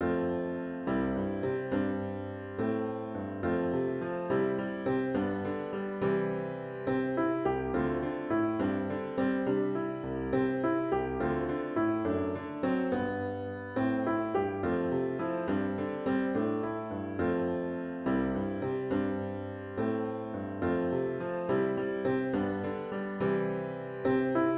God Be the Love to Search and Keep Me piano with Intro